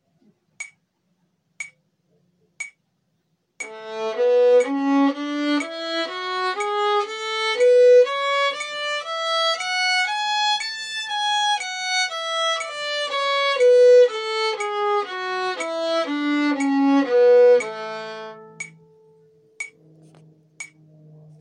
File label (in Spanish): Escala de la mayor en corcheas con metronomo